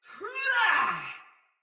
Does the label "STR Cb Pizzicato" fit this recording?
No